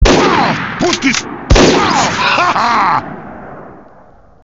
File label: sniper rifle; pootis pow